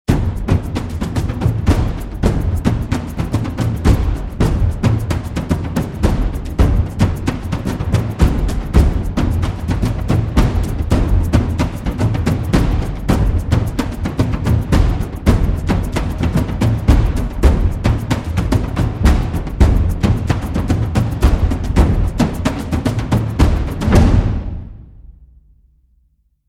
Hybrid Drums; Abbey Road

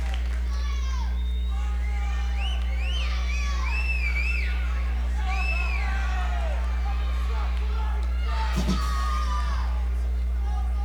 Tuning-Breather

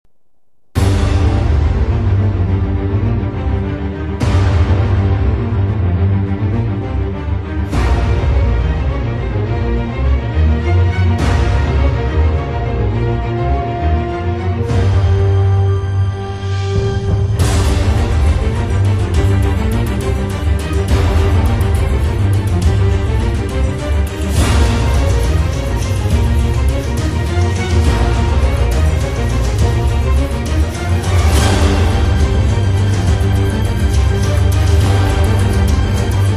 Orchestral, трейлерная музыка, Action & Drama

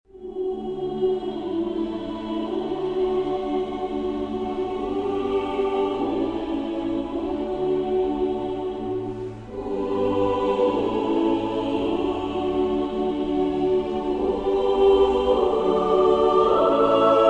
Choir; Abbey Road

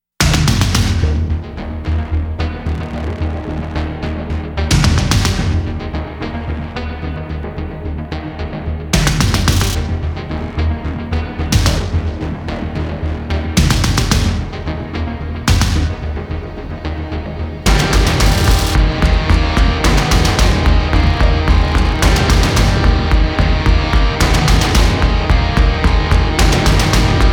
No Choir Rise

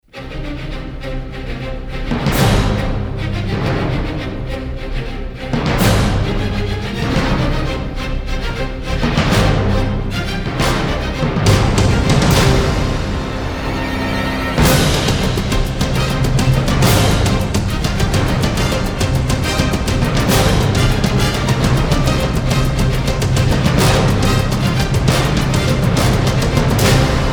Blasphemy (No Choir), Orchestra

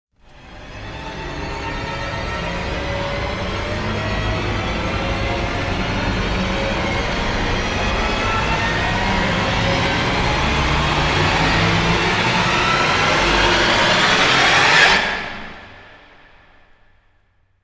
Orch Rise

